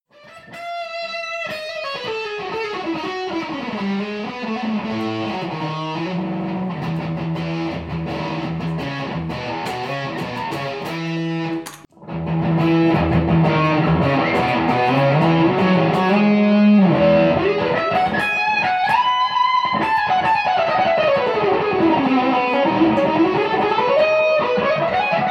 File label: SECRET PREAMP BOSS DS1 DELAY; CHASE TONE